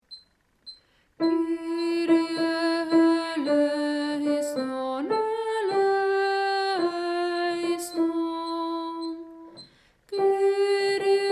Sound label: Kyrie piano